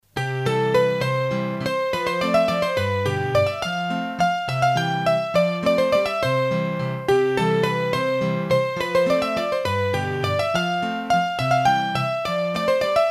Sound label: Bourree, hurdy-gurdy, Auvergne